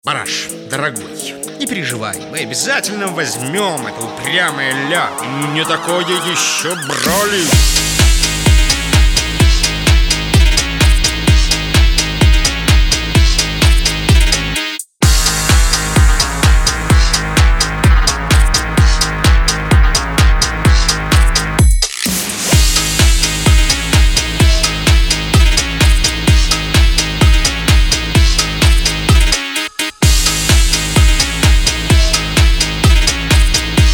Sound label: phonk